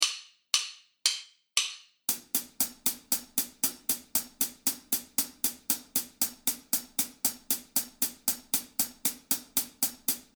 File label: Hi hat